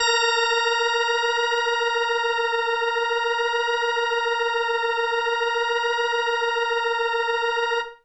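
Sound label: Indie Organ Kawaii A#3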